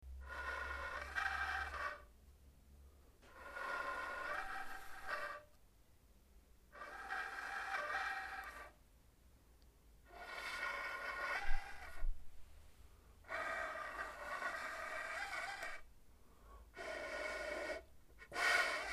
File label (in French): FLUTE NOIRE; LA KERMESSE DU COLLÈGE